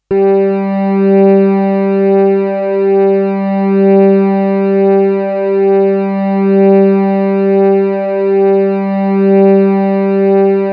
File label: Third String G